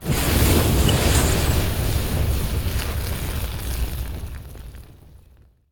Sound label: Flame Sizzle; Evolve; Goliath